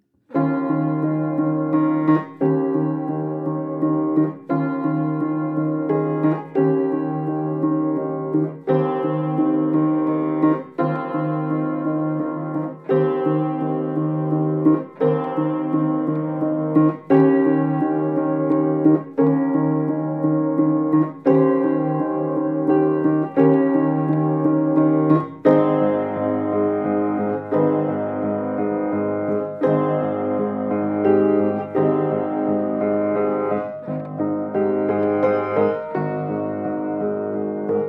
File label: piano song